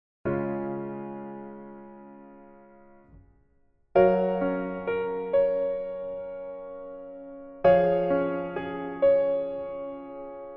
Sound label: Piano ver